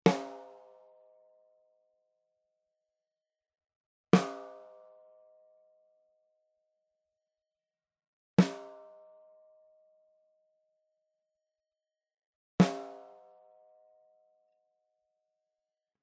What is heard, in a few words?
Snare Top mono